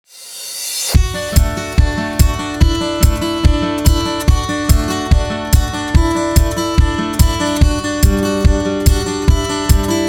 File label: Inspiring energetic Acoustic Guitar; Mantra Studio